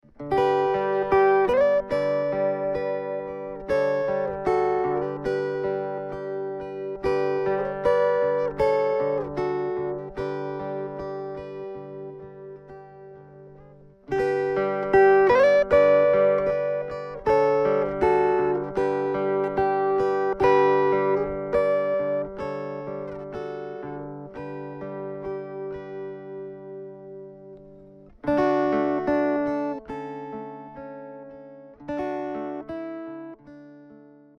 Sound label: An instrumental piece; Ashington folk club